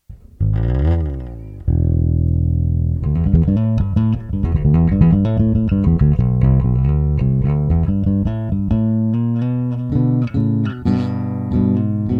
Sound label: VINT JB neck BASS; electronics